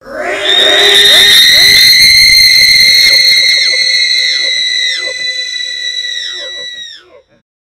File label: the reeeeeee bomb